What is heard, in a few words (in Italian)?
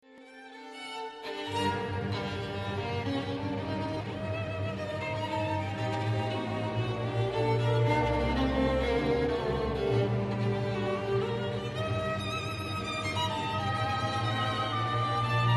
Recitativo Allegro moderato; Bruch; Konzerte